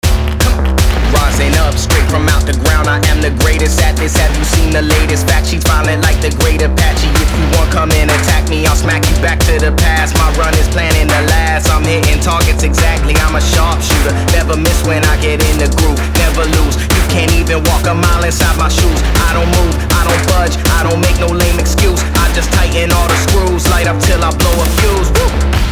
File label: rap mix